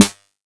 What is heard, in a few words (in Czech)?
buben